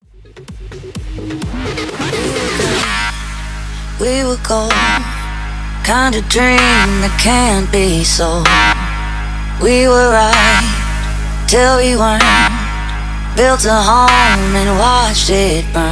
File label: Acid 128 BPM